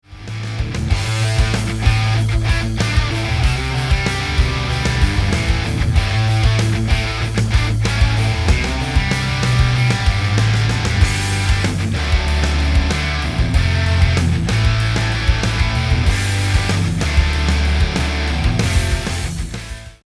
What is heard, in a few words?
A Minor Classic Rock